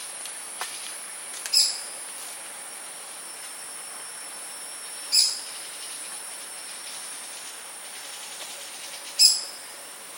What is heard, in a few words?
short-tailed babbler; SEPILOK